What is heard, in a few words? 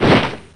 woosh coat